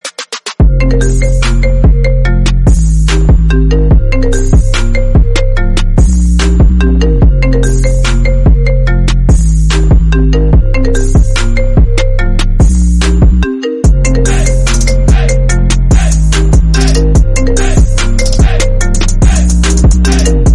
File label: iphone mix trap remix music